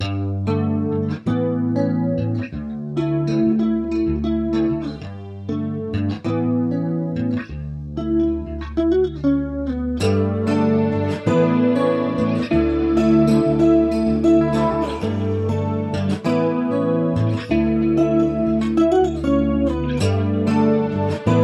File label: 192bpm D maj